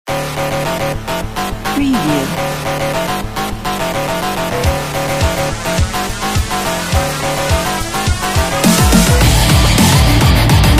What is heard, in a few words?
Rousing Industrial Dubstep Rock Stock Music